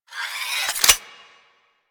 Pistol Reload Spin Stop; DE; Warframe; Weapons; Skins; Capstan Pistol Skin

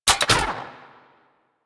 spear gun mech; Warframe; Weapons; Corpus

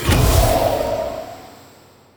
Polluter Eye Restore; Ambience; Glades Of Andurin

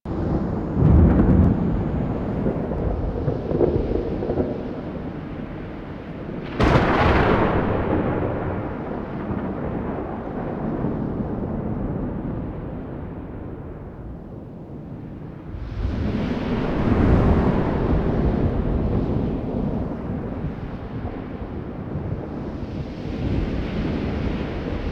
Lightning Storm Loop; Ambience

